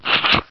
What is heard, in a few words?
Pump Rifle, Cheesy Lo-Fi Sound Effects